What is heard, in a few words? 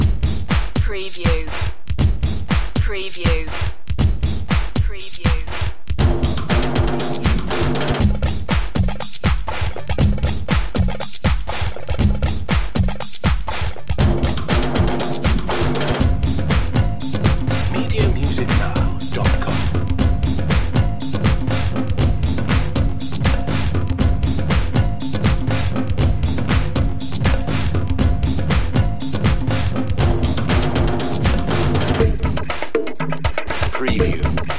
afrika call